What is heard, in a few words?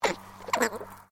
Animal Alpaca Female Grumble